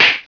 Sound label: baseball hit, Ball Bat